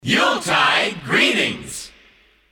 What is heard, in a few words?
christmas kit group shout yuletide greetings